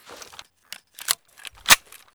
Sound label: spas12 reload empty, weapons